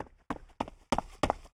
Run Come One

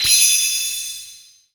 Enemy Waterplant Active Charge; disney star smash android ios mobile gamerip 2020

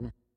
Hover Hands; Going Under

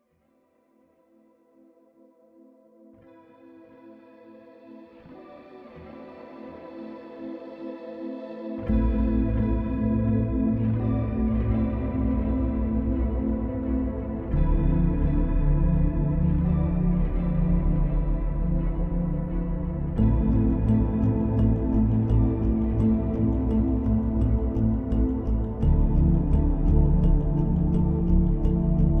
Stinger Shore Sunrise; Peak (2025)

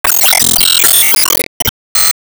Enemy Bigflame Active Hit; disney star smash android ios mobile gamerip 2020

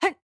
Attack Normal; Jackie

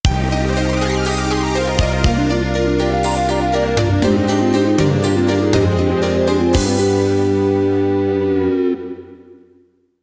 GAME OVER [Roland GS]

